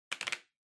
Calculator Switch

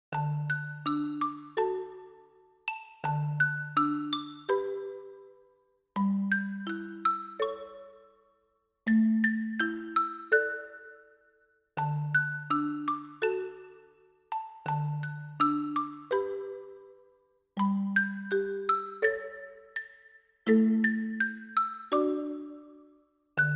Jingle Bells, Slow

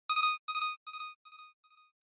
Short Accent Delay; ショートアクセント; ディレイ

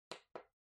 Electric Fan Far Switch

